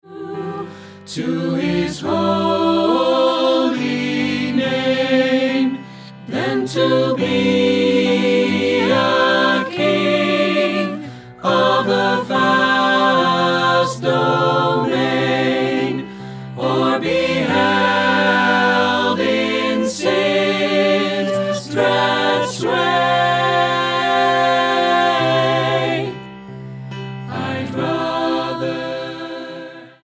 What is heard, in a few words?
Gospel Choir; Id Rather Have Jesus